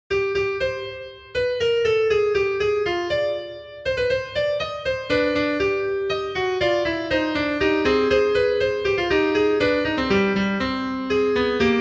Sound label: fugue in c minor